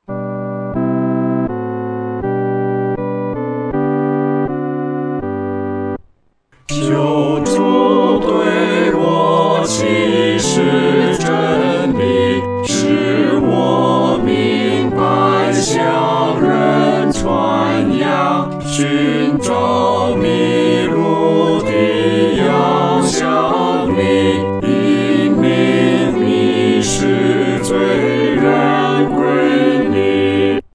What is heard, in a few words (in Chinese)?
合唱 四声部; 求主对我说